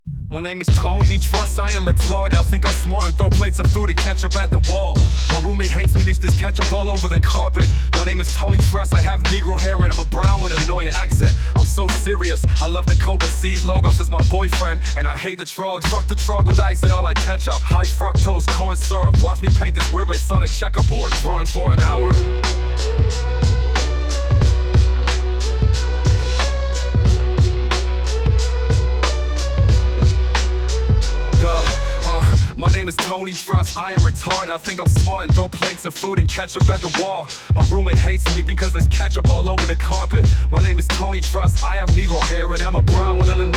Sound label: rap